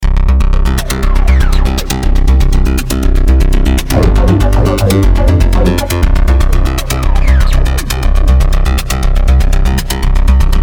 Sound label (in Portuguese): musica; pinball